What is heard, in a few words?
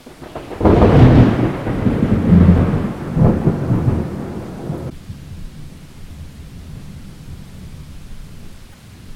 Thunder; rain; nature